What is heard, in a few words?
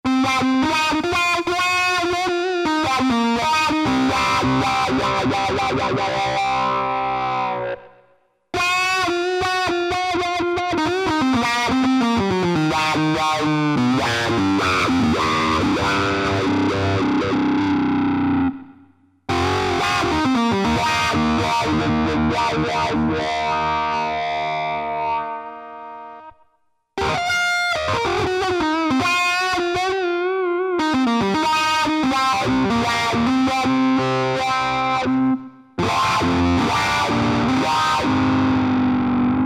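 wah pedal, guitar; Factory Patches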